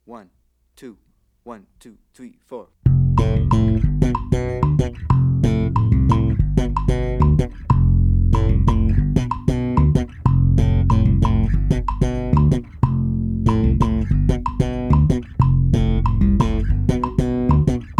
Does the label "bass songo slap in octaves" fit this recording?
Yes